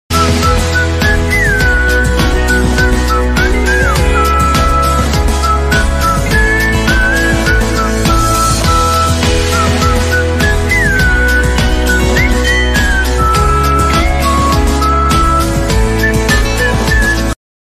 theme music ringtone from the legendary korean tv series; descendants of the sun